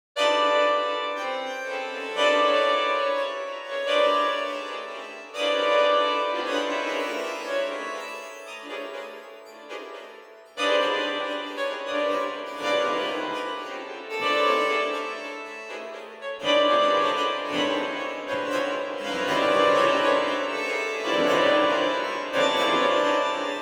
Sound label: Strings; Xenakis